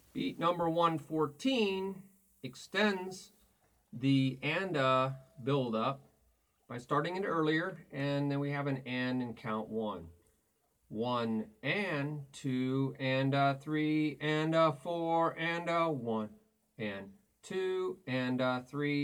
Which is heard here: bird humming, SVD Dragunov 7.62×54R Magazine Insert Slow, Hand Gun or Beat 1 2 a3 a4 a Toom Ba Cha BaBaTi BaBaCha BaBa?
Beat 1 2 a3 a4 a Toom Ba Cha BaBaTi BaBaCha BaBa